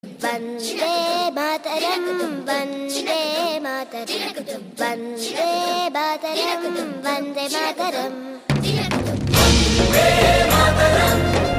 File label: Telugu Ringtone; Kadgam